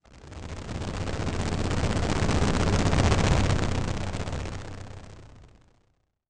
Transition Tremolo; Production Element

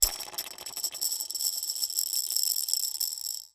Foley Rattle Spin And Shake

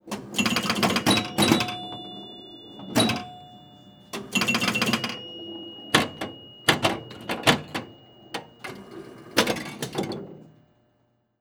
Technology Electronic Pinball Machine Played

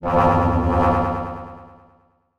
Title Transition Stab Dark Short; Production Element

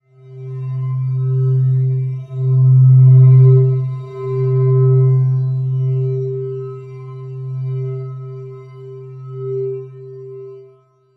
Transition Stab Dark Musical Tonal; Production Element

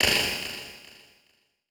Click Mechanical; Multimedia Internet CD-Rom Flash

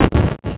Transition Growls Vocal; Production Element

